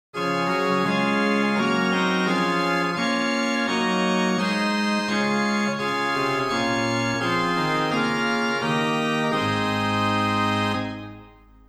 Ged 8 + Rohr 4 + Nas 3 + Oct 2 + Ter + Qvin, organ stops; Silbermann middle-sized church organ; St. Anne; Hin: Gedacktes 8', Rohr Fleute 4', Nassat 2-2/3', Octava 2', Tertia 1-3/5', Qvinta 1-1/3'; Reinhardtsgrimma